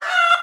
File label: chicken cluck